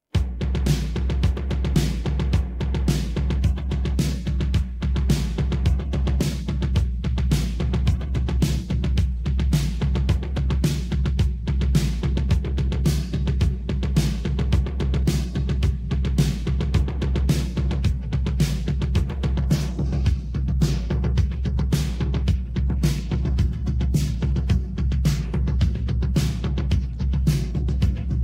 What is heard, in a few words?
drums C minor 108bpm; 441hz